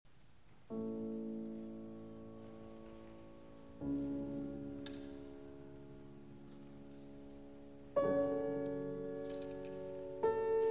Piano Sonata No. 29 in B-Flat Major, Adagio Sostenuto; Op. 106; Hammerklavier